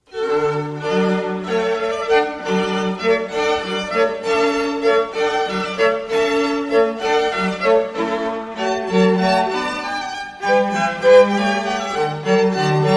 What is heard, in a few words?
hornpipe water music suite in D; Handel